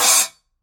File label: splash choke